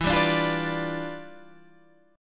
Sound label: E Minor 7th Flat 9th E